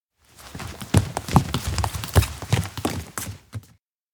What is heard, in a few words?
steps indoor hurry; spica; anemoi パッケージ版 1.1